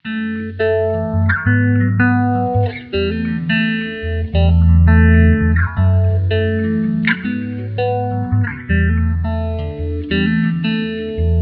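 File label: riff gm 84bpm processed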